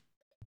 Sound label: PER Percussion